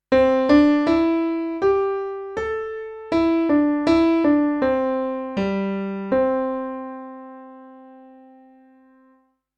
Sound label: melody